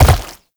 Land Flesh, Movement; Warframe; Uriel

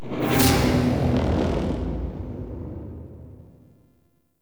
Reveal Stinger; TheTeacher; Teshin; Warframe

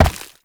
Step Ice; Warframe; Uriel

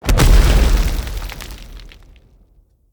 Claw Slam Attack Impact; Attacks; Animals; Bromius

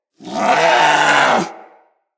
Vocal Alerted, Hunting Critters; Characters; Vulpaphyla

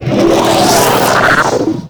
Capital Ship Missile Whizzby; Warframe; Corpus

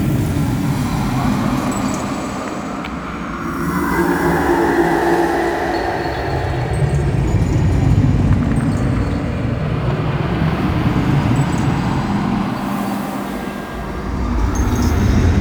Moon Interior Amb; Warframe; Ambience; Orokin Moon

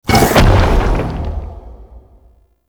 Door Close; Warframe; Sentient Devourer; Ambience

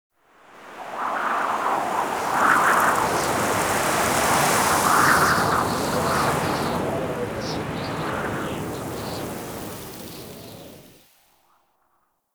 Exterior Wind Gust; Duviri Murmur

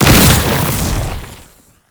Concrete Archgun Projectile Empowered Start; Warframe; Archwing

